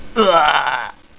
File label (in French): Aouch!; Amiga; StixNTrax